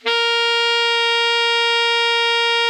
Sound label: ALTO MF A#3, Saxophones; Greytsounds; Philip Wolfe David Crozier's Saxophones